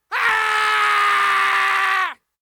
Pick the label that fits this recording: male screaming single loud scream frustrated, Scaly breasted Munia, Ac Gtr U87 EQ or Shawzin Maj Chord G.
male screaming single loud scream frustrated